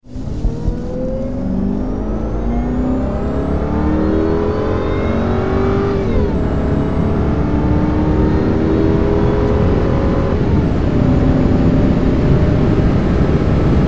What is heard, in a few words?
XKR - a bord, jaguar